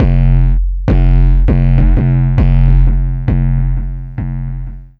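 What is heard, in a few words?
MINX bass drum, drum loop; Big Fish Audio; Uncivilised G'rooves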